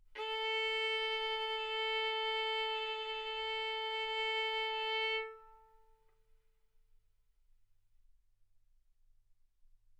Violin arco mf sul A A4 B4 stereo

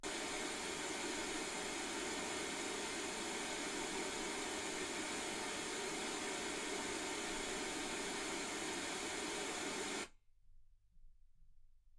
wgn

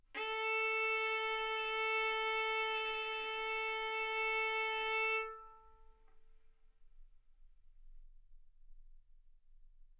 Violin arco A4 B4; mf; sul A; stereo

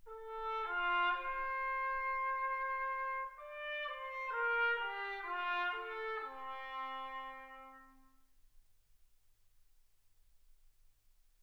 trumpet

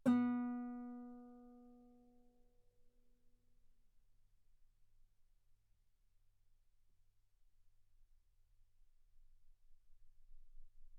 Guitar ff B3

